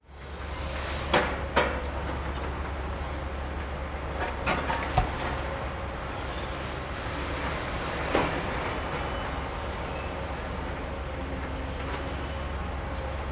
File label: Ambience Construction Site